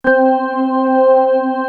VFX ORGAN; East West; Global Sounds; VFX-SD

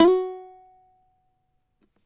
CAVAQUINHO SLIDE, Guitars

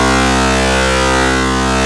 FUZZ WAVE

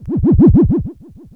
Emu Wobbles, Emu Modular Drums

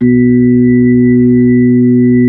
B-3, Brite

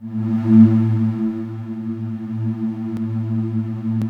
Matrix 12 choir A1, vintage keyboards; Big Fish Audio - First Call Vintage Keyboards

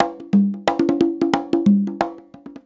90 PERC

